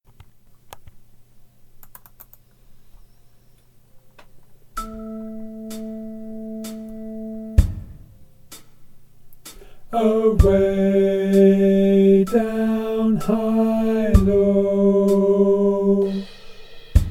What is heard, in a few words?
Tenor; Tom's gone to Hilo Tenor singalong track